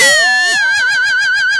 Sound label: NOISE; AKAI S6000 CD-ROM - Volume 1; SAMPLE WAVE MIXING 2; NOISE&SE